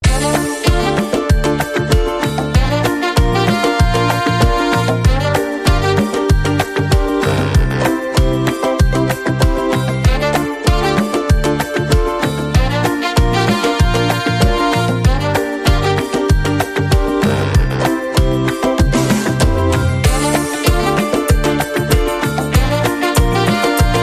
80s Ukulele Disco

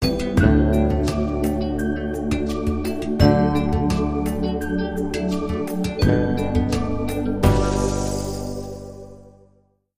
London Bridge is Falling Down

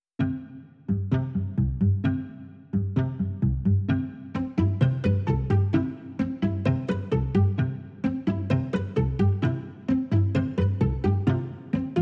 Strings Motif Only

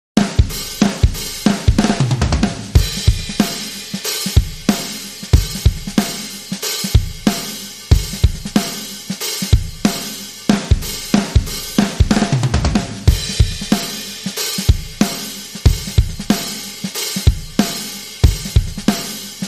Drums

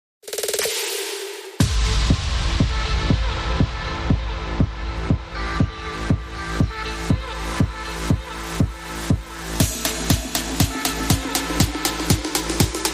No Boxing SFX No Lead Synths Mix

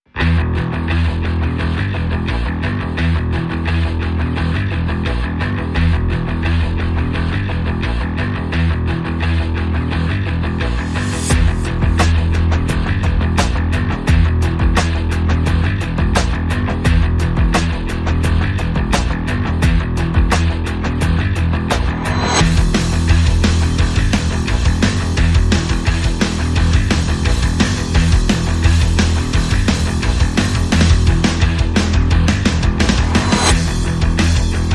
No Guitars

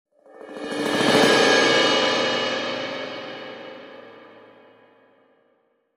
Faint Cymbal Roll

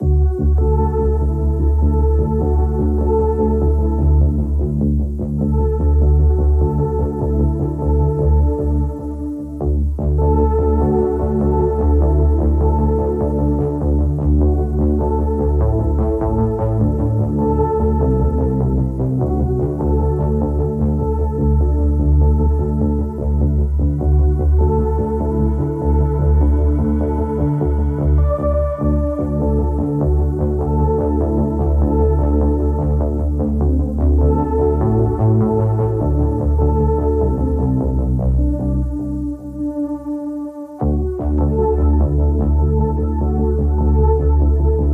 Underscore

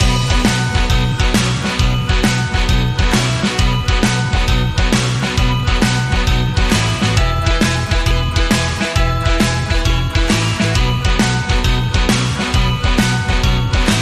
Rock n Roll Christmas Loop Bell

